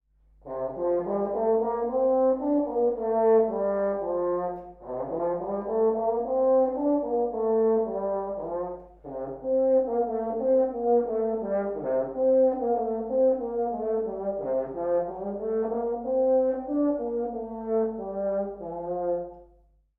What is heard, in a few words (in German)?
Sound Horn; blaeserjugend; musikmentoren